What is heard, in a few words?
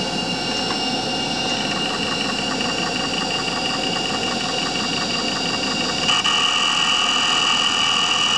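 hard disk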